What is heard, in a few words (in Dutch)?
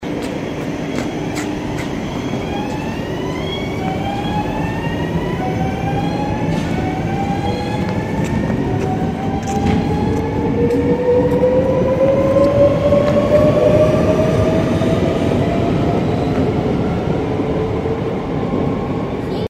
sprinter geluid